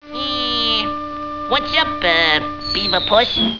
bug

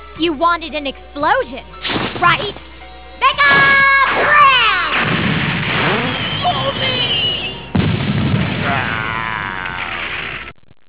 explode; Lina: "You wanted an explosion, right? MEGA BRAND!!!"Jillas: "Mommy!"Gravos: "Uhhh..."; TRY Volume 1; English

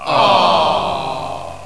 awww

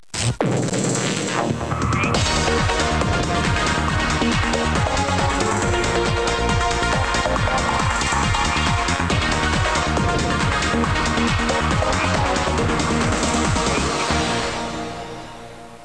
theme tune

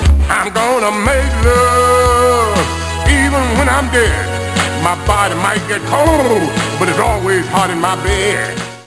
chef zombie song

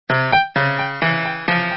piano

